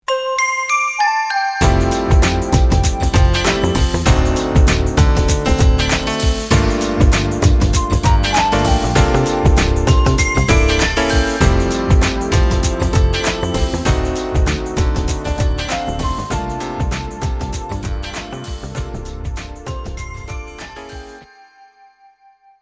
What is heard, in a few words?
richer soul Instrumental